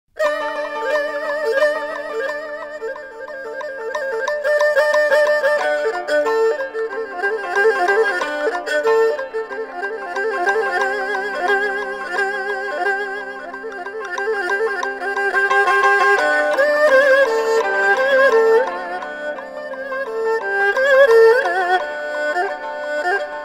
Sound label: Chinese Ensemble; San Francisco